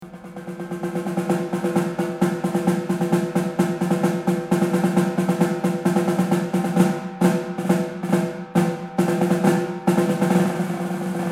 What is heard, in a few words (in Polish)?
Bęben tamburyn talerze triangiel marakasy klekotka kastaniety terkotka